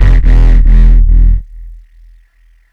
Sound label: Squidgy Bass; AMG - Prototype Drum & Bass